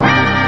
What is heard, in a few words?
Bad Hit Sound; OrganWaah; Beat Saber Miss Sounds